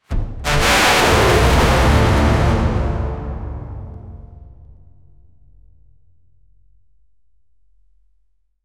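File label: extremely loud fortississimo, brass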